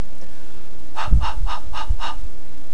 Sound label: Huh huh huh